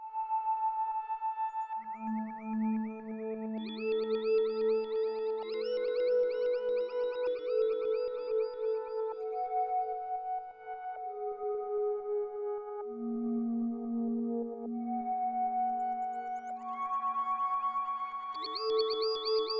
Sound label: Dream Trigger, Korg Radias-R